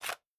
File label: m4a1 clip out, weapons; rif m4a1